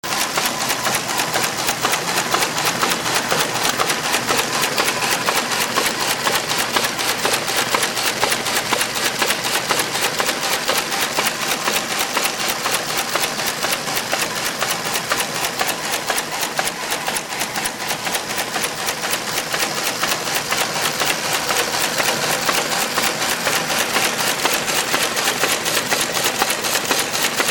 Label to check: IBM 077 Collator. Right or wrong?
Right